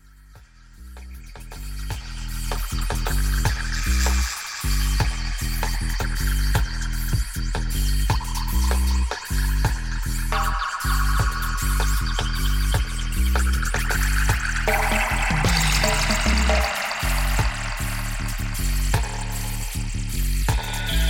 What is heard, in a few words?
Swing Bass In Dub